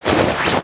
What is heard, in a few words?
backpack cloth; aronwk collection; ndaudio